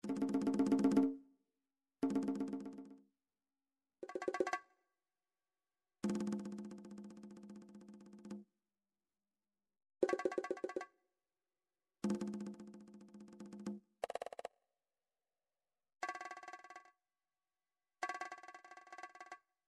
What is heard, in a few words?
drums percussion; Midi Vol 4